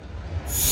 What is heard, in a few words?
bonus flyin; Great Book Of Magic Deluxe; Wazdan